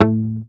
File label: Bass, MUTES; Sampleheads; Will Lee Bass Library; HAMER12